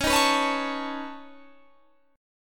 Db 7th Suspended 2nd Sharp 5th Db down Guitar Standard; Db7sus2#5 strummed; JGuitar